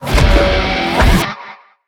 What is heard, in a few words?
buildup; smite; chaac